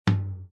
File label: tom; drum pad